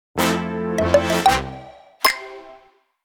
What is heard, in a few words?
Exciting